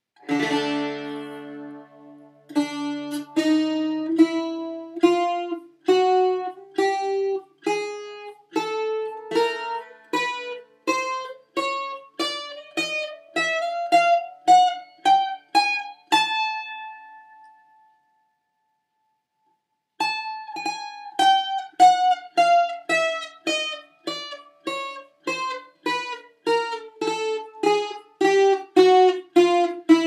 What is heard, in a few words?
mpouzouki